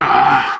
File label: backstab